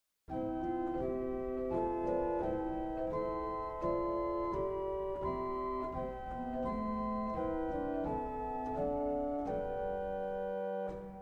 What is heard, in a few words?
Flute; CavColMainz